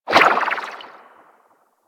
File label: Ocean Poison Plant Pulse; Ambience; Warframe; Grineer Ocean